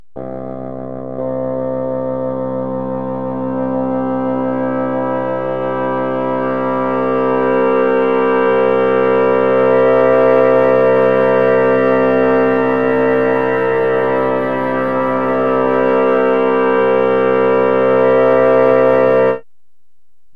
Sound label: harmonic series